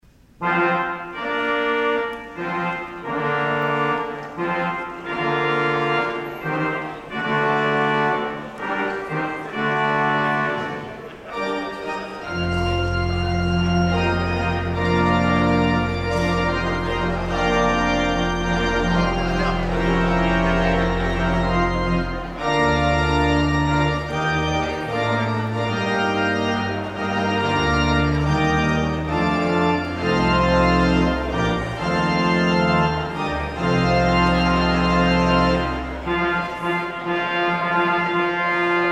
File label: Wedding Fanfare, organ